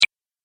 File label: Game Power Switch, video game